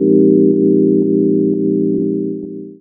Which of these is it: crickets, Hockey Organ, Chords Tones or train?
Chords Tones